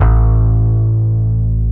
BASS, A1; Zero-G; Chemical Beats; BASSES

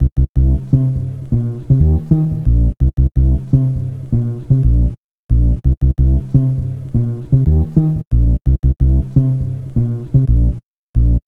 Bass, Hip-Hop

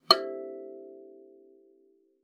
KALIMBA E5; Xsample VOL-7

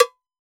Cowbell; Roland BK-5; Percussion

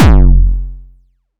Kick, Drum Hits

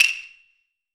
Hyoushigi, Roland BK-5 Percussion

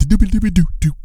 GO FILLS, Vocal Blues Soul; Spectrasonics; Vocal Planet

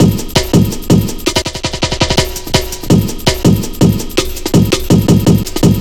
165, drum loops, drum & bass; Total Drum&Bass

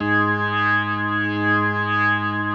B3, First Call Vintage Keyboards

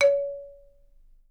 C#4, Percussion & Harp; Miroslav Vitous - Percussion & Harp

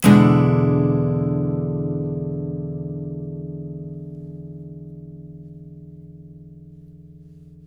CHORDS, Blues Guitar; ILIO; Fretworks

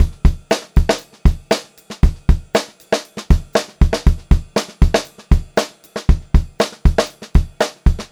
Pop-Rock Drumloops 118 POP VARI; USB Soundscan vol.37; AKAI

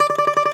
DOMRA

